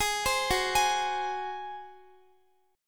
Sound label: Gb Suspended 2nd Flat 5th Guitar 4E,4A,5D,5G,5C,6F; arpeggiated